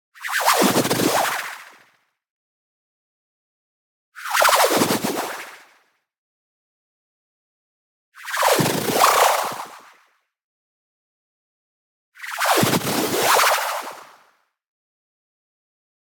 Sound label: movement fast, modulated white noise shift; boom library; Anime Essentials